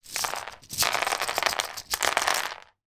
game monopoly game plastic houses and hotels pour out of cloth pouch on playing board